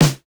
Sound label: snare, snare house